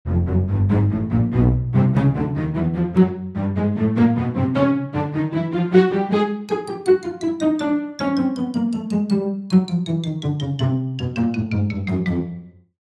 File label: cartoon ascend then descend pizzicato strings tuba xylophone; zapsplat